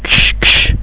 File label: cards